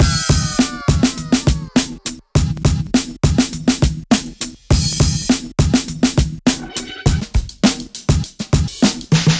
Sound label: Break 102BPM